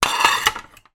plate dish place into draining rack between others, household